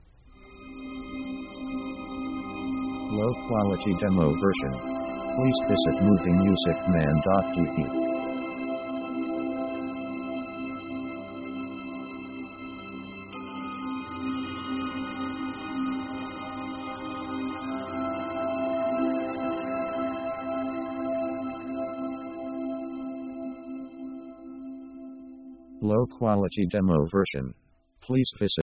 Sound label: police; world themes